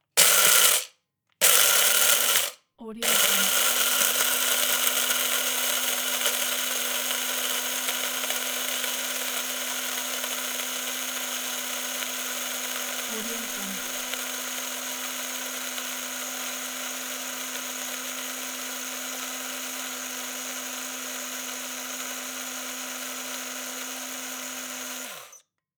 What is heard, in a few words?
grinding coffee